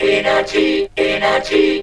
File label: VOX SUNG; AMG Megabass REMIX! VOL-2